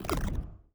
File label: Poison